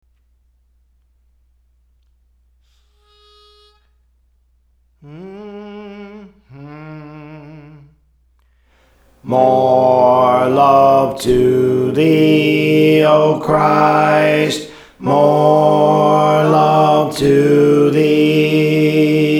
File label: Alto; Praise For The Lord Training; More Love to Thee O Christ